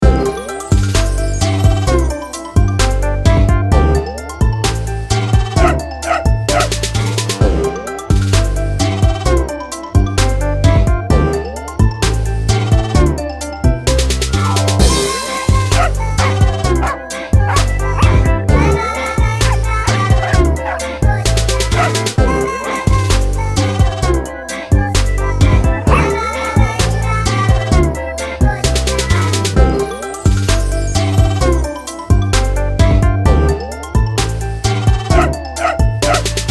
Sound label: bgm